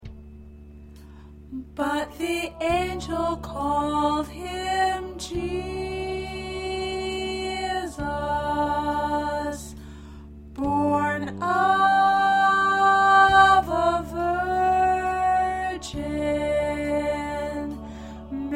Soprano, choir parts

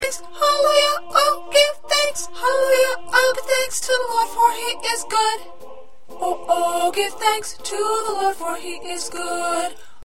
1st Soprano

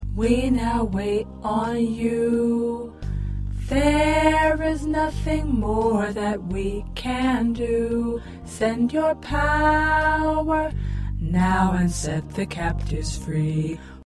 Tenor, choir

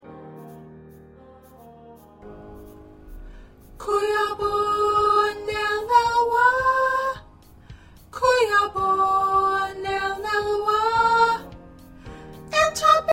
Soprano, choir

